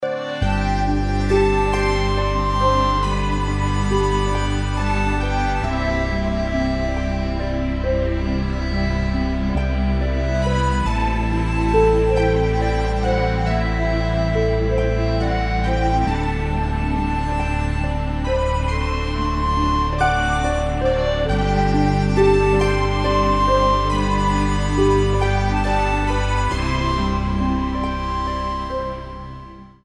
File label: Fairy Lullaby, Cheltic Music; Suite Coll. Vol. 19